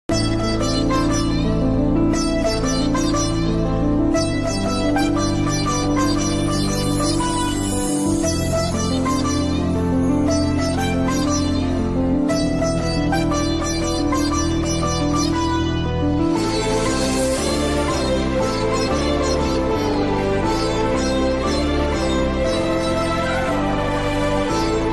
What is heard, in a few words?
love bgm telugu; vunnadhi okate zindagi bgm